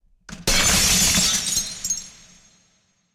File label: shatter